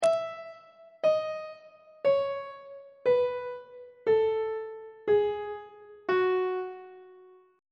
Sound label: f sharp dorian mode descending on treble clef